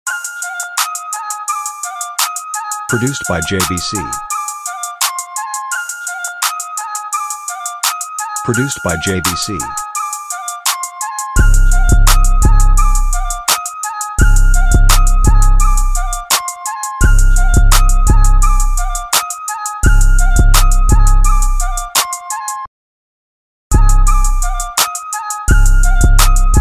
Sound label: Instrumental